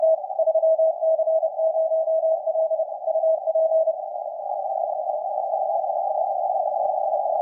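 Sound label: 160m, signals on 160m band; 3C0L; RAØFF; 80/160 meter bands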